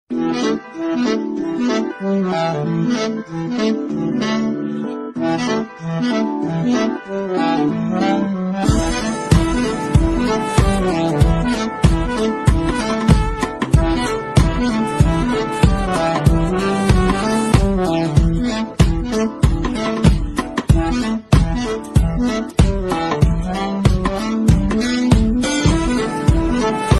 love bgm ringtone telugu bgm ringtones mobile ringtones; Gully Rowdy